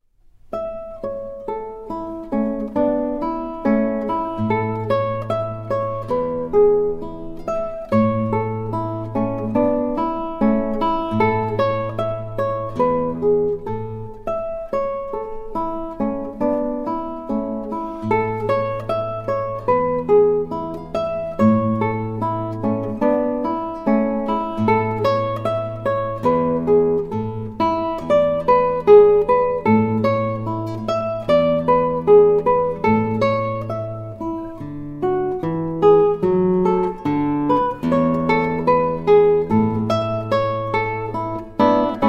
andante, guitar

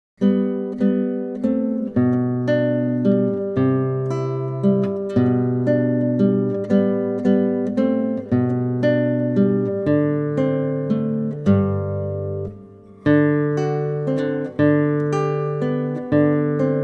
moderato, guitar

